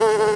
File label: Amb Animals; Red Orchestra Ostfront 41-45